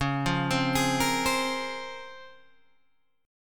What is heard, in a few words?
Db Major 13th, Guitar Open G